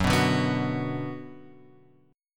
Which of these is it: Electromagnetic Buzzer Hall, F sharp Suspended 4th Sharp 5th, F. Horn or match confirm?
F sharp Suspended 4th Sharp 5th